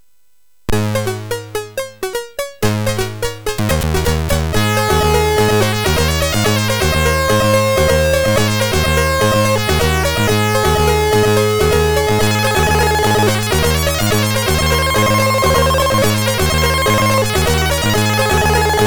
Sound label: Music Demo Version, MOS6581R3; hvsc